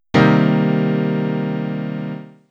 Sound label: Cm7 chord, piano